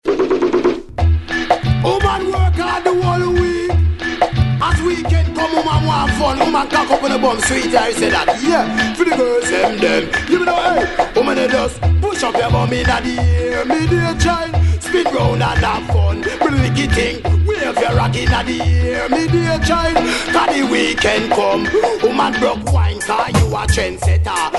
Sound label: SAX